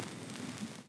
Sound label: Crackle Tuning, Texture; Loops; Ableton Live 12 Lite; Core Library